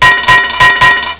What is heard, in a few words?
coin out; pvpoker